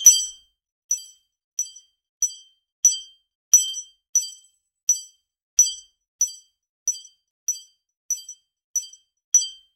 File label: sharp high pitched metall